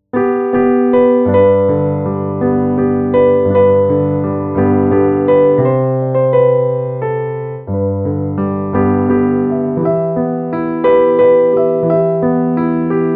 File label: Therapeutic Music Classical Waltz; Brahms